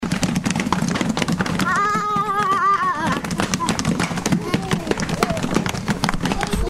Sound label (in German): fuessestampfen